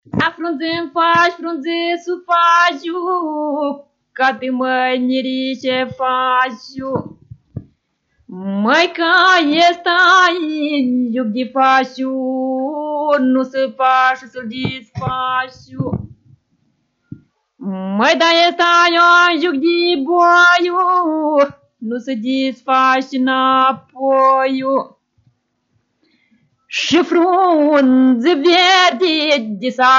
Cantecul mirelui; Vaslui

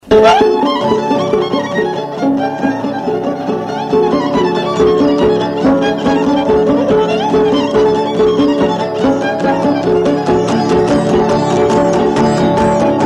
Paduretul taraf; jocuri si cantece batranesti; Vaslui

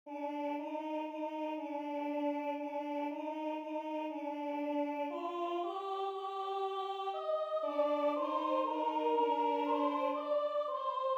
Maria se preumbla, Muntenia, colinde

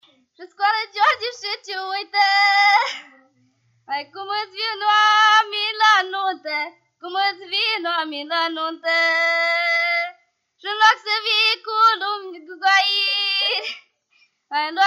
Bocet flacau; Vaslui

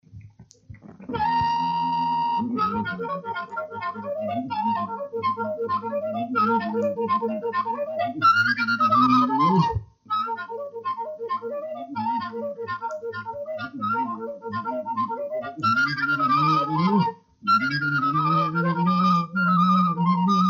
De joc fluier; Vaslui

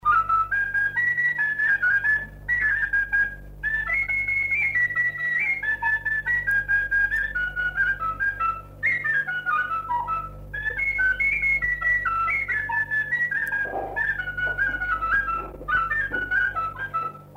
Stejarelul la fluier; jocuri si cantece batranesti; Vaslui

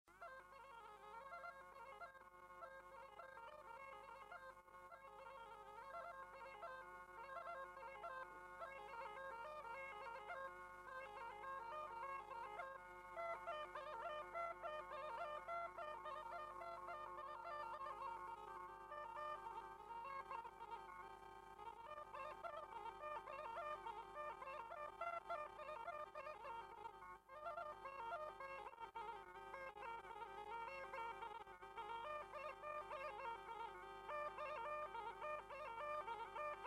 Sarba la cimpoi; jocuri si cantece batranesti; Vaslui

